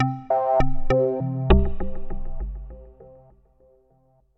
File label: Fall And Bounce Arp 100bpm C2